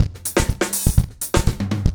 123 Pop Drum Drive; Pop Rock

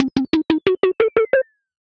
Drum Base, Synth Rhythmic; Ableton; Glitch and Wash

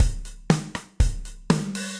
Kit Warm And Low, Drums, Standard Kits; Ableton; Factory Packs; Session Drums Club

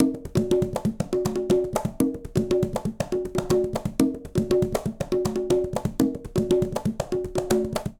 Baion B Quinto-120bpm; Latin Percussion; Ableton; Factory Packs

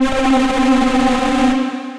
Synth Lead; Wild Geese

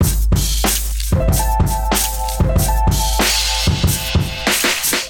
Kit groove 94 bpm, Drums; MIDI Clips; Bang Ya Head; Ableton; Chop and Swing

